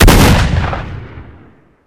obrez shoot; STALZONE

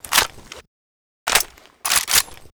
vss reload; STALZONE

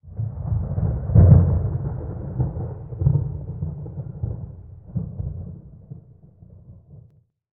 Small ambient pre storm; STALZONE

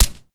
pp2000 silencer; STALZONE

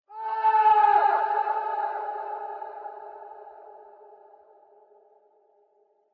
moan; STALZONE; minecraft

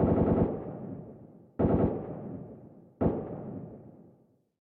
Small ambient shooting; STALZONE

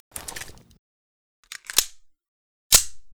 ots33 reload; STALZONE

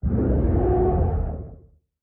Small ambient fog; STALZONE

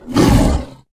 boar attack; STALZONE

